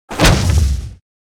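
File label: dead book attack; monster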